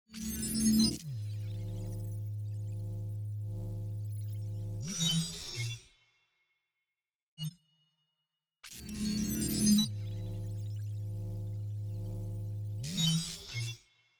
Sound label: Psi Mech Spell FX